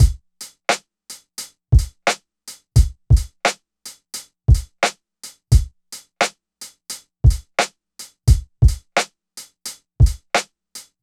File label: swing loop; Hiphop